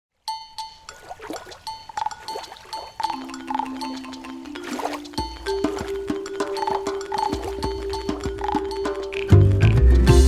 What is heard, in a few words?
instrumental; five speckled frogs